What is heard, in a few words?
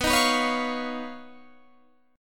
Eb 7th Suspended 2nd Sharp 5th, B